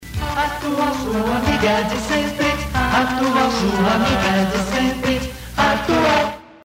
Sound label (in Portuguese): Radio Atual SP vinheta